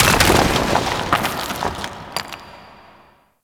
Rock Fall Medium; Ambience; Gameplay; Warframe; Orokin Moon

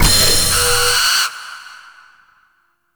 Item Found; Warframe; Duviri; Owl Recon

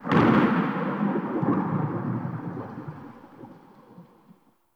Cloud Thunder; Warframe; Monkey King

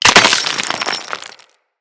Container Open; Ferrite; StorageContainer; Material Specific Loot Containers

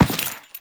Step Default, Footsteps; Warframe; Teshin

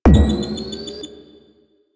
Alt Proj Pre Explosion, Blastor Pistol; Warframe; Weapons; Corpus; Plinx

